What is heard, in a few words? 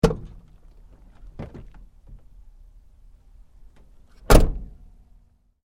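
Truck GMC Savana Door Open Close Light; Vehicles; Trucks; Big Room Sound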